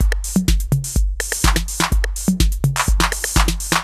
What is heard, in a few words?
drum loop body full 125; Classic Chicago House; Sample Magic